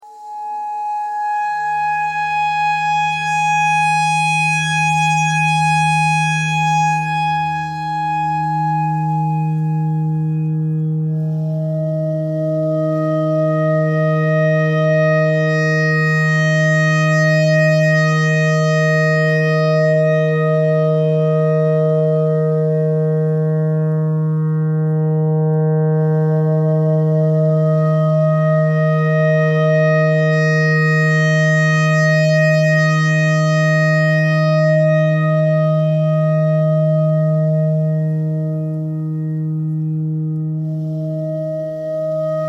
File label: clarinet notes e clarinet notes e thread simple